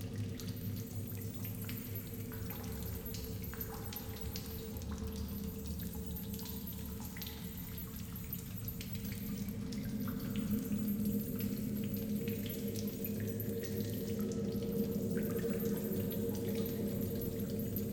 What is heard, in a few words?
ambience BG FX; netent